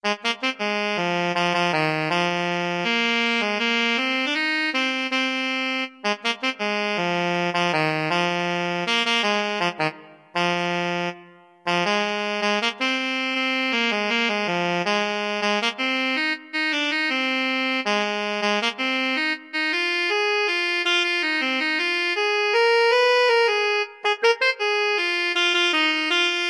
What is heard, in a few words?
Funk Study, saxophone